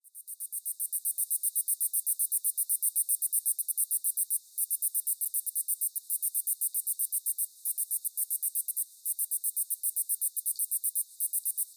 Diceroprocta arizona, cicadas, filtered; US; AZ; Diceroprocta arizona Davis, 1916

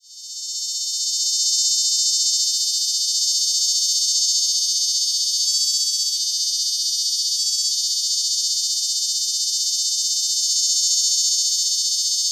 Cornuplura nigroalbata fragment, cicadas; US; AZ; Arizona; Cornuplura nigroalbata (Davis, 1936)

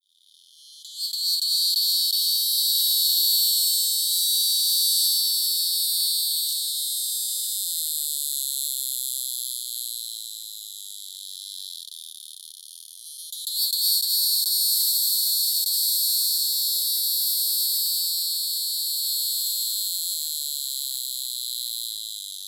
Neocicada hieroglyphica, cicadas, filtered; US; LA; East of the 100th Meridian

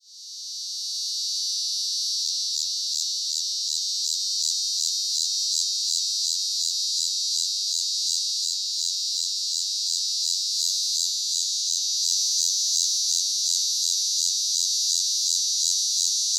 Neotibicen dealbatus, cicadas, filtered; East of the 100th Meridian

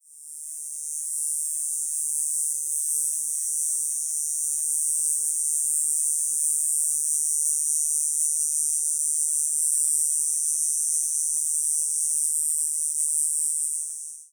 Diceroprocta apache fragment, cicadas songs; US; Diceroprocta apache Davis, 1921

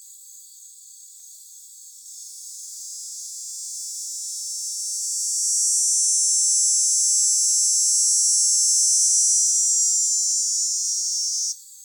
Neotibicen canicularis, cicadas songs; US; NJ; East of the 100th Meridian

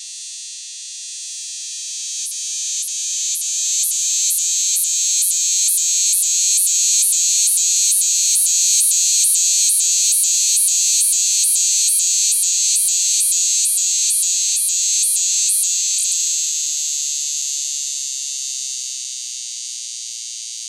Neotibicen similaris apalachicola, cicadas; US; FL

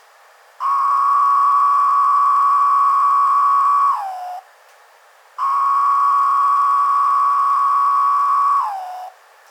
Magicicada tredecim clean, cicadas; East of the 100th Meridian

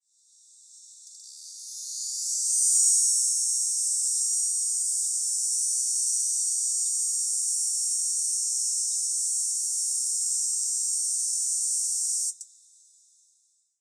Diceroprocta biconica, cicadas, filtered; US; FL; East of the 100th Meridian

